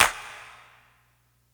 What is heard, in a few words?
huge clap one shot g sharp key; single hits one shots